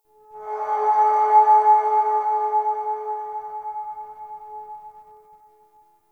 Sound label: Singing Bowl; Warframe; Ambience; Clan Dojo; Dojo Randoms